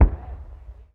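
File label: explosion anti-aircraft gun sky burst distant; Explosions; Call of Duty Modern Warfare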